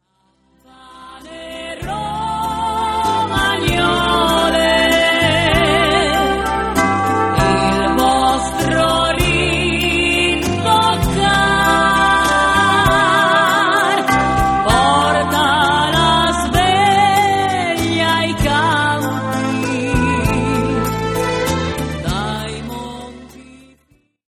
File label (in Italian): campane romagnole